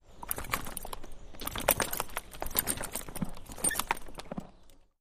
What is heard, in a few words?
Carriage Horses, carriages